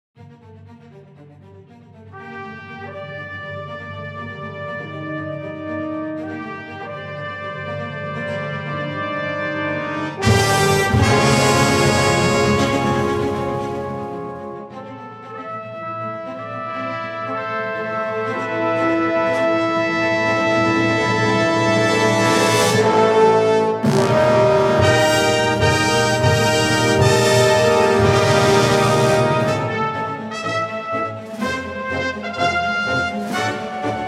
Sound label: Dimension Brass